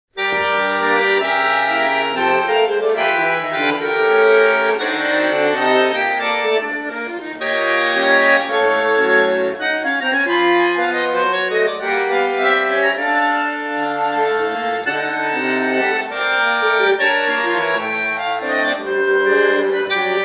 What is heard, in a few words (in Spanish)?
musica; CONCIERTOS EN VIVO; Museo Sivori